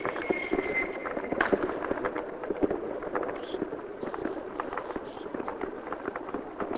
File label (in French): feux artif